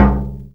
KICK LARGE, VINTAGE FUNK; Zero-G; Funky Elements

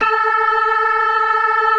DRAWBAR VIB, Synth and Organ

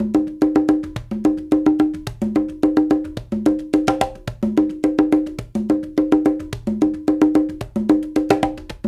108 TROP; Spectrasonics; Supreme Beats; African Contemporary

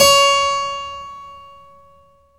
KEY HRPCR, Roland; Roland - LA Composer vol. 1

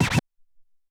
Wah Gtr

